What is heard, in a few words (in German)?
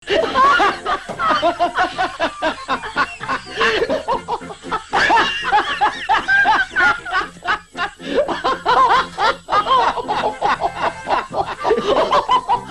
lachen; klingelton